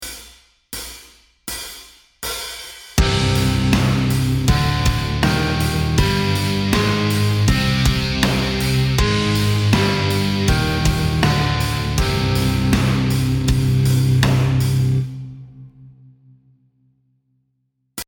Exotic Modes C Hirajoshi Japanese Relative Eb Major; metal music theory